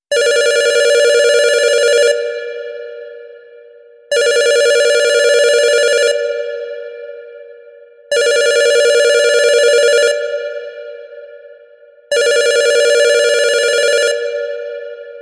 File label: Phone In Hall Ringtone